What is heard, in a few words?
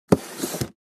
Wooden drawer opening